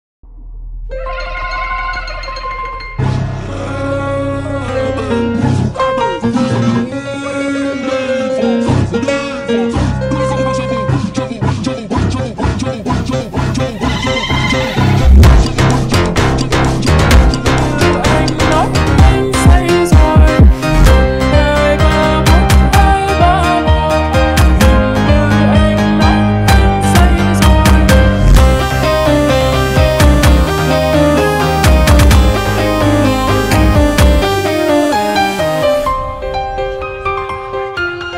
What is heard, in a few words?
PHONK SONGS on PIANO